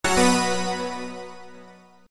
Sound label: Bonus Win, slots; The Lucky Fairy